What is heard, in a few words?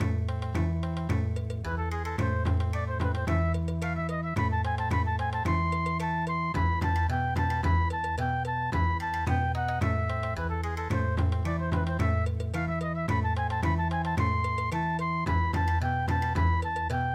Rondo Alla Turca Bon Odori